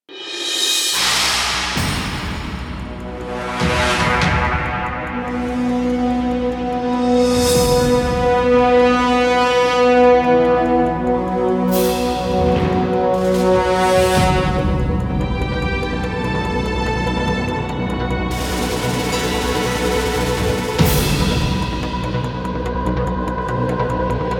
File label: Combat Dogfight; Elite Dangerous